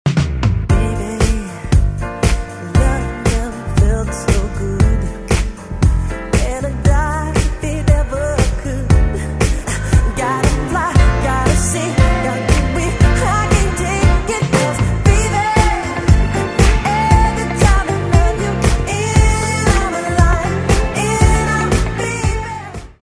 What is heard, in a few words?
TV ad song; Jeep Call of Summer